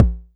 BD; TRX

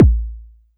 Noise

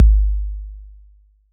BD 808 Decay

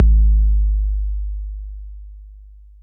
BD 808 Deep

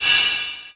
Crash Cymbal

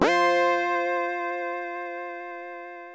SYNTH Cosmo Harp

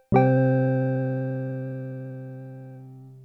SYNTH; Timex

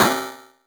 Metalica

